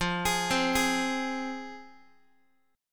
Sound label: F Minor F down Guitar; arpeggiated; JGuitar